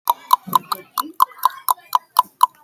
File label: tribal clicking